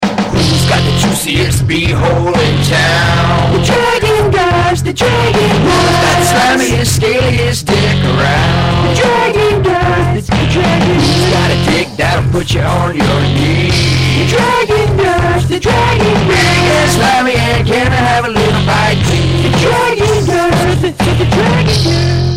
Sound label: RINGTONE